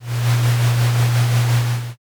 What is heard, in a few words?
conveyor